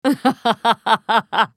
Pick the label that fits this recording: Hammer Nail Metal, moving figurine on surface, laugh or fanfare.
laugh